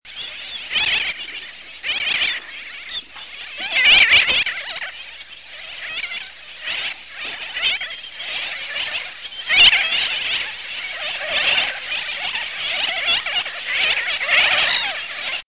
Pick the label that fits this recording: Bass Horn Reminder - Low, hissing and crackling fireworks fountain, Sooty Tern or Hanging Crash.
Sooty Tern